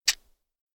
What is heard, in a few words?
clock tick